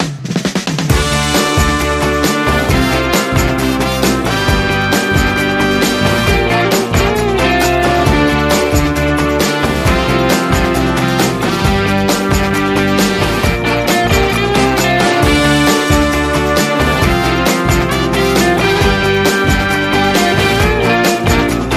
Soul Beat

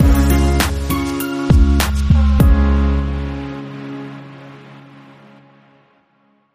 Bumper

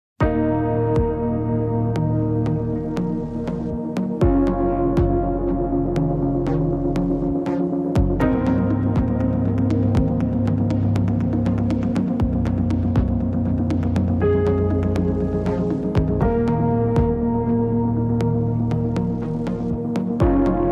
No Thunderclap SFX Mix

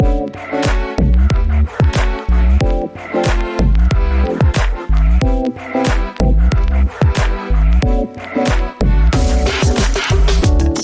Gentle Mallet

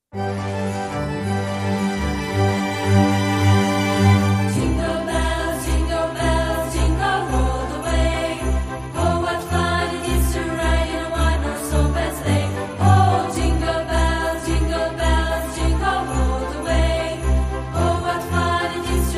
Jingle Bells

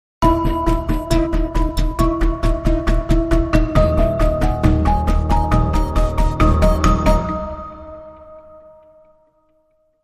System Alert, Sting

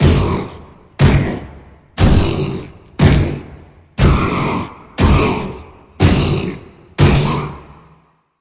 Goblin March Pulse

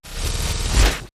Soft Flutter, Taylor Grand

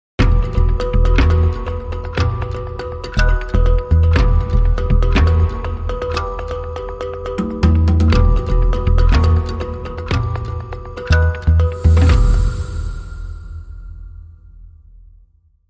Anxious Rhythms, Bumper

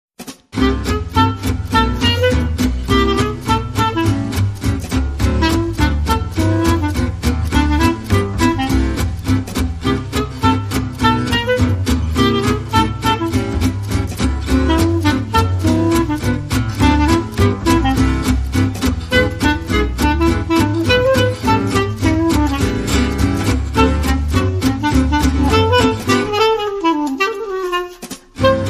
Clarinet Version, No Lead Guitar

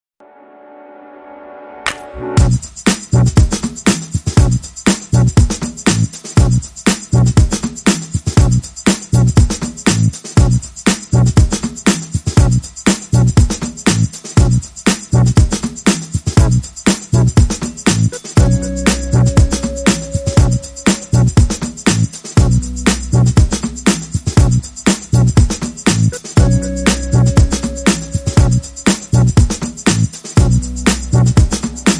Groovy Strings

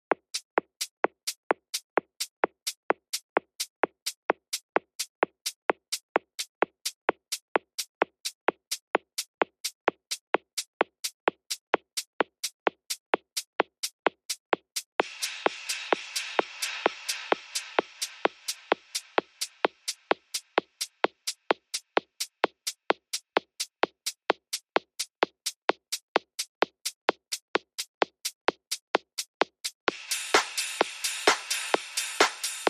Drums and Bass; Shinkansen Bullet Train

